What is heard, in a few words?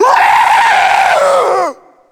gorefast chase; kf2; gorefiend; slender